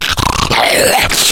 attack, slender shiver

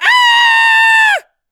halloween scream

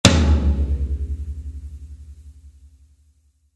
drum

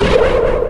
poof; tf; Advanced Weaponiser

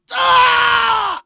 mega pain; hl2dm

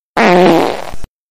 fart

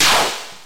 boss shot; tf; danmaku fortress

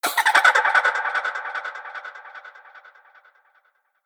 laugh; slender; hat ghost

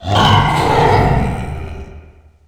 wretch tunnels amb alert; A Machine for Pigs

